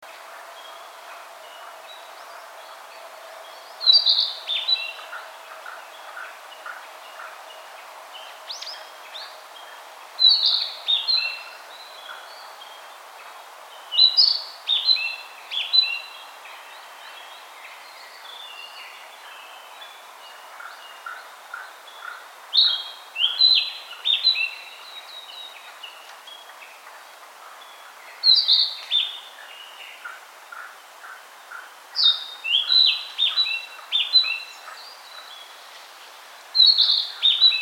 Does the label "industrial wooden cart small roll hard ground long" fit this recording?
No